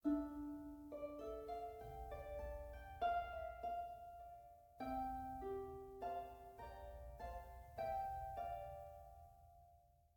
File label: harp